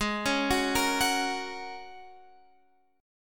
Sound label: Gb Major Ab; Gb/Ab arpeggiated; JGuitar